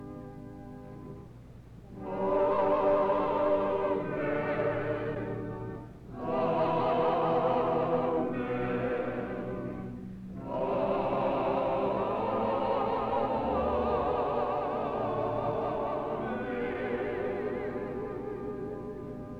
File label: Three Fold Amen